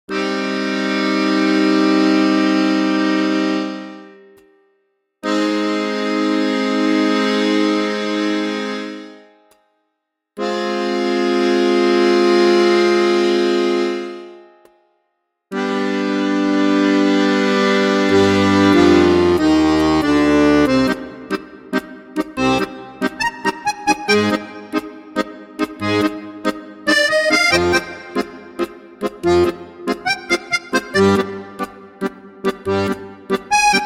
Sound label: Play Accordion